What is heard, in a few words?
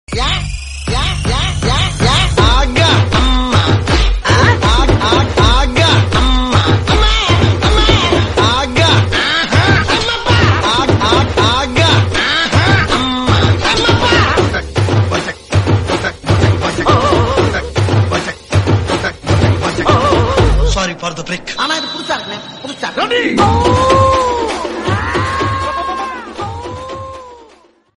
funny comedy bgm remix; vadivelu; sundar c